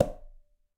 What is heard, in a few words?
Tumba A Slap Mute, Latin Percussion; Drums; Drum Hits; Conga; Ableton; Factory Packs